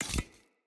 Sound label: Urn Metal Pickup